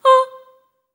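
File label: staccato C5, soprano, Voices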